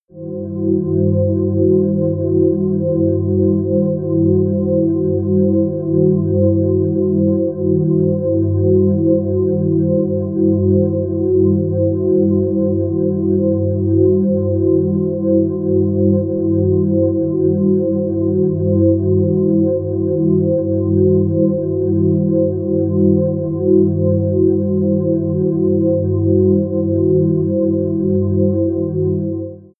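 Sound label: bright high, pads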